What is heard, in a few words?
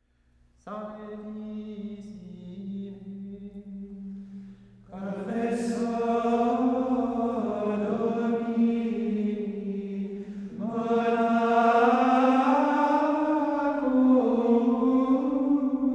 Antiphon SANCTISSIME Mode 4; St. Benedict